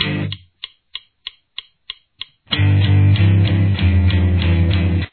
solo rhythm guitar

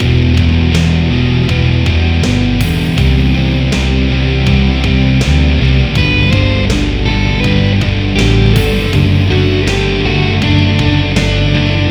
main riff fill

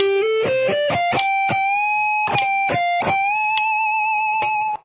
solo

